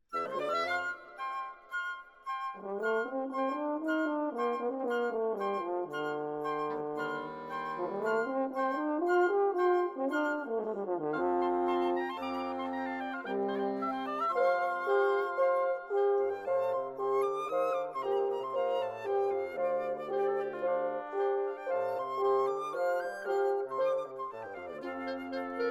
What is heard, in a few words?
Woodwind Quartet